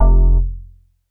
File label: bass classic F; M8; Polyend; ARC Noise